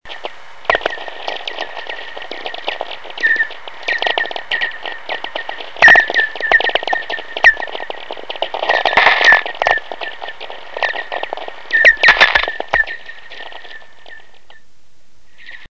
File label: vlf tweeks